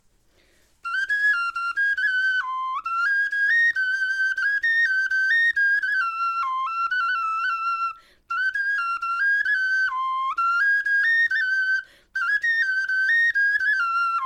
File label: melodie, knochenfloeten